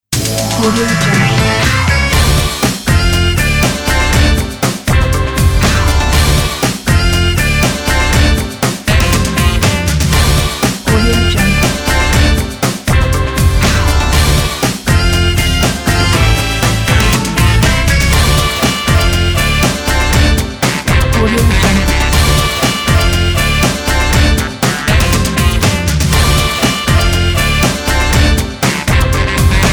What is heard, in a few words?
energetic upbeat funk; newoldideas